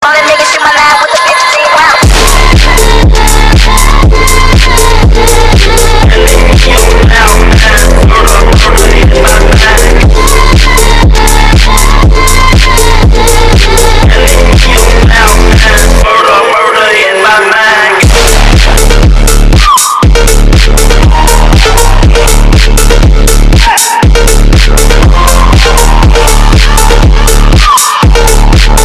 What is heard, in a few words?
funk